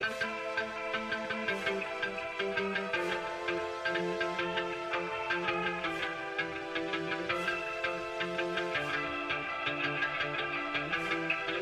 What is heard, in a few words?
Melody; PixelJunk Shooter; PS3